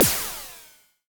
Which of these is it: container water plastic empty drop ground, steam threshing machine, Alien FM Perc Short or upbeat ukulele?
Alien FM Perc Short